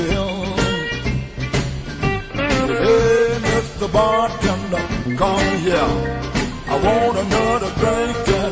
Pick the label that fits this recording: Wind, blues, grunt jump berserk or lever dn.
blues